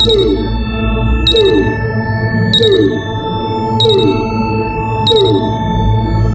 Marker Pulse

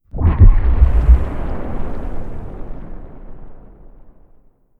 City Silo Explosion

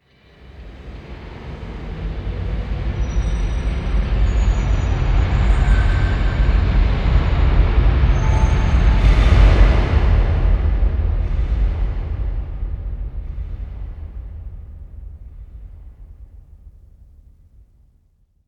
Pillar Wet

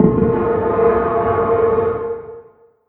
Random Rock

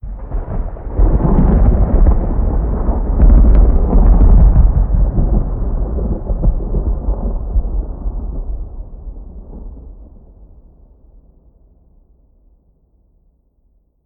Sphere Thunder

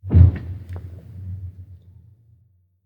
Random Ice; moul

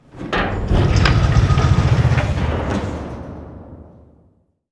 Gate Open; moul

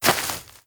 Grass Walk

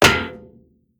Metal Run; moul

